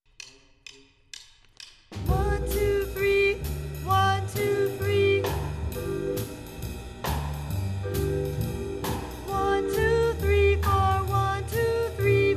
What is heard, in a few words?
Vocal Improv Examples